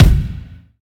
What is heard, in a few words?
clicky kick one shot E key; kick drum samples